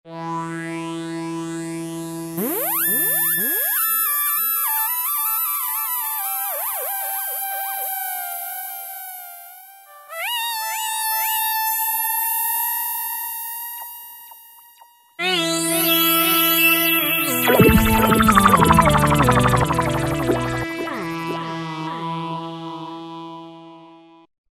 Split Solo, Roland V-Synth; Factory Patches

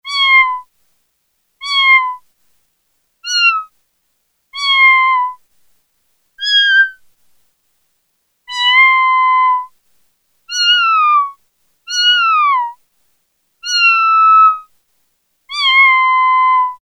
Cats Meow; Sequential Circuits Prophet-5 (Ver.3); Factory Patch; File 2 Programs

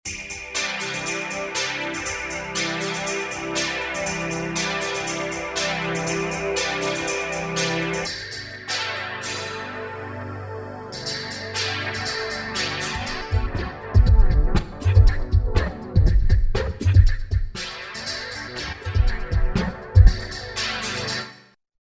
1 Finger Strum, Roland V-Synth; Factory Patches

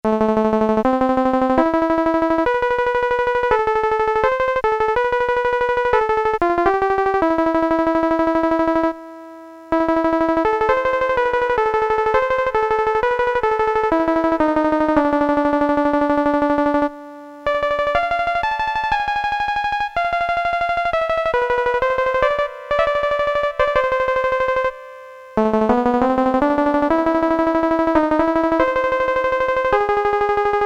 mandolin, Casio MT-68 preset sounds

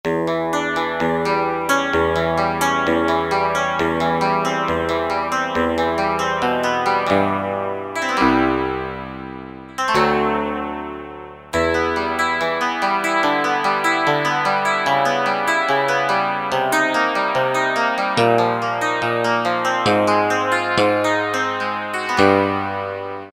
Stratocast, Kawai K1m; Factory patches - I banks

